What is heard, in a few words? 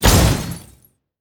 flash atk